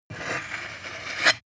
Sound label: sword scabbard handle pull